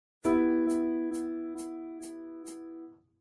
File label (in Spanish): Efecto de sonido, Piano